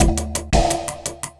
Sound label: LOOP, Drum & Bass, 170 BPM; Ueberschall; Drum & Bass Flash Trackz